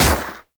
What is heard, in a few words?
Shot, Akimbo Pistol; Grineer; Prisma Twin Gremlins; Weapons